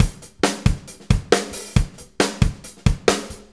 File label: Drum Tools, Loops